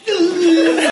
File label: Uuuuuuuuuh